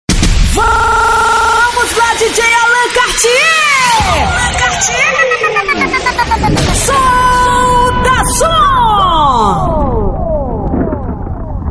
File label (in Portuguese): vinheta; vinhetas gritadas